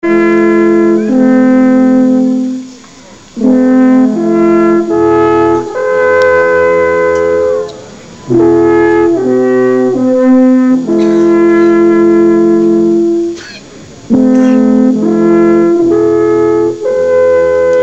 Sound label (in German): alphorn